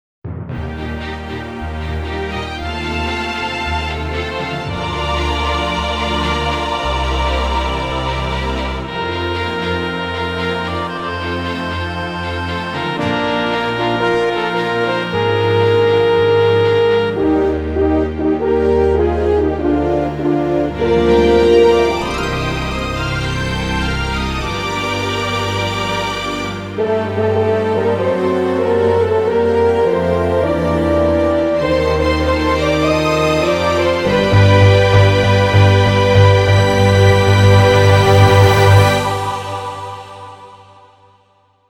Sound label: Cover Sinfonico; Villarreal CF